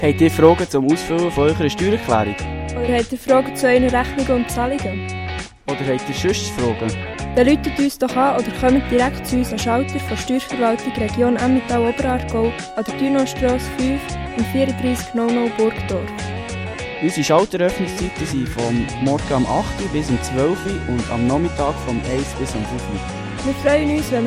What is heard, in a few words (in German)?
Jingle; Steuerverwaltung; langnau berufsschule